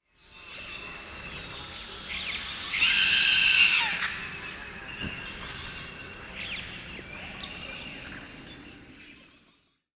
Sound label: Black caracara